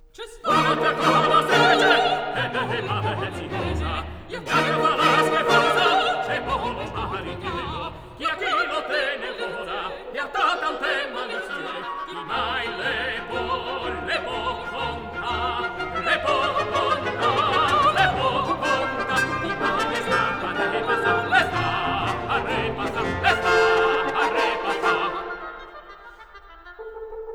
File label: Pulcinella, ballet with song, orchestra, Allegro; 'Nce Sta Quac